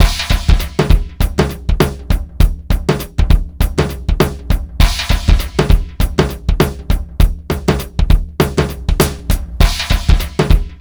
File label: JAZZ, Funky Xtreams; INIS